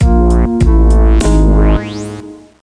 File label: Music Lose